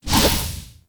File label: Scythe Charge Swing Whoosh; Weapons; Melee; Warframe; Archwing; Exalted Archwing Scythe